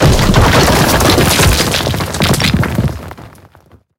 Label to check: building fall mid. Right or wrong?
Right